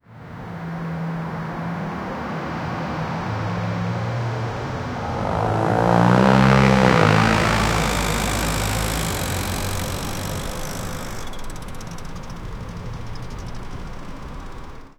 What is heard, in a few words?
Soviet auto ZAZ, automobiles